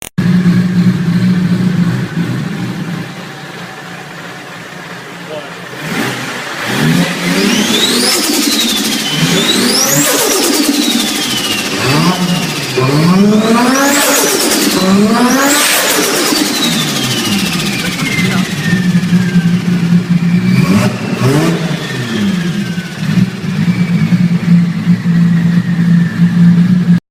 Nissan Skyline GTR R34 Turbo Sound, turbo spool sound